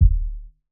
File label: Kicks; Touch Loops; CosmicFunk